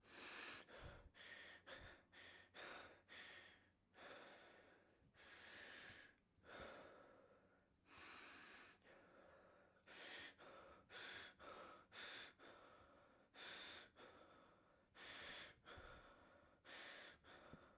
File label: Male Heavy Breathing After Running